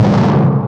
FUZZ BASS DR, PHUZZ DRUMS; Spectrasonic Distorted Reality 1

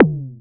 MS-20 Tom; EMU PX-7

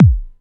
BD, Dance Mega Drums, HIP

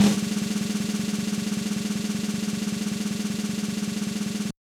Snare Roll, Yamaha DJX 2B

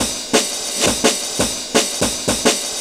Sight Break, breaks

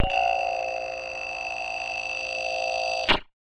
Casio, Glitched, malfunctioning synth

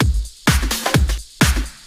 Clubhouse Loop 128 BPM

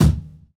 BD; Best Service; Dance Mega Drums

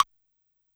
Wood Block H, Yamaha PSR-E323 Standard Kit

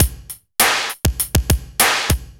BPM100 SYN

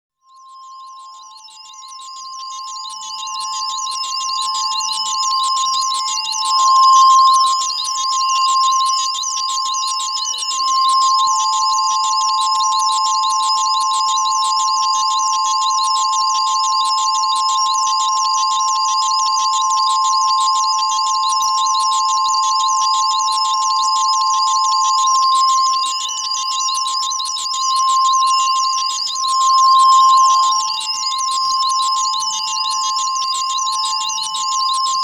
Planetary Machine Computer; Bizarre Planet

